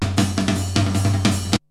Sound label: BREAKBEATS, TECHNO